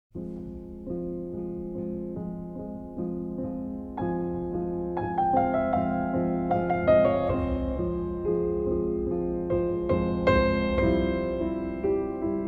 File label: Rondo in F Minor for Solo Piano; Succession Season 2